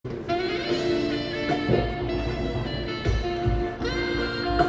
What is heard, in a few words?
saxaphone